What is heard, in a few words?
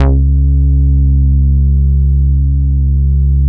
C#3, Boom da Bass; PERISITE; E-MU Producer Series Vol. 6 – Analogia Project (CD 1)